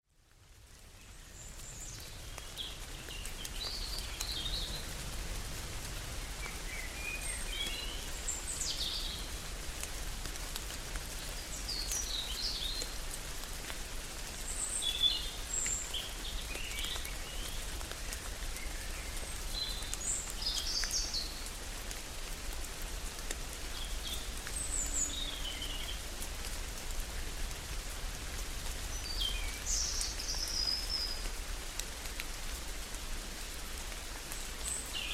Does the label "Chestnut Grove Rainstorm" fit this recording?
Yes